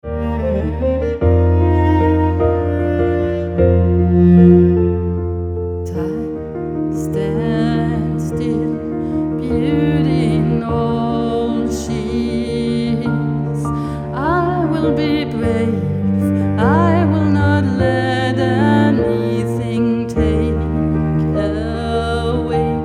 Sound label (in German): Gesang Cello Klavier